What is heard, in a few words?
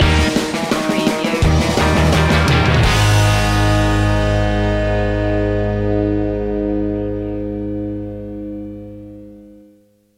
surf stinger; rock